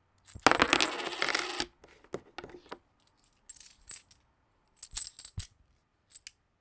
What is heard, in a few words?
coin; お金を机の上にばらまく音２